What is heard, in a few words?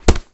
Boxing Gloves Touch